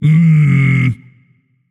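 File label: non-verbal tongue; smite; atlas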